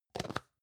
plastic can small lid close medium

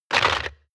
crab movement cracking

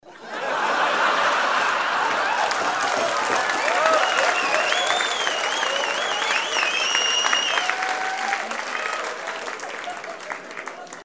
laugh applause yell

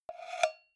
cowbell scratch hit muted

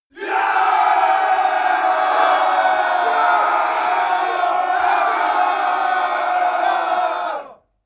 battle cry

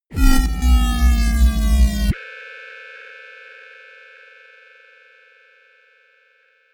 synth drop

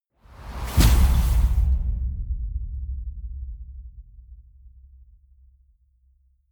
gust hit

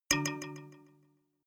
notification kalimba delay major soft

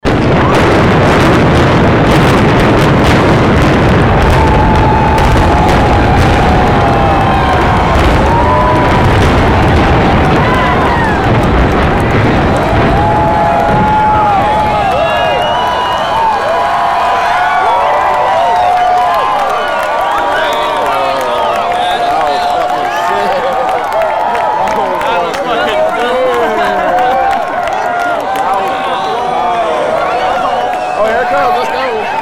stadion crowd; kingdome

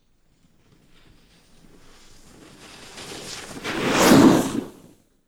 snowboard with carving ice

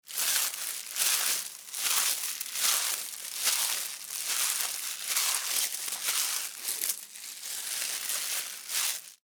trudge noise

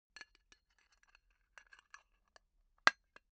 tobacco tin close unsteady long

